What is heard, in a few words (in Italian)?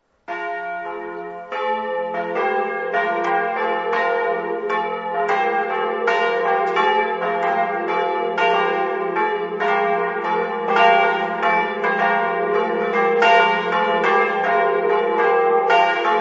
campane